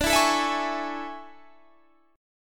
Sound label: A Major 7th D; AM7/D; strummed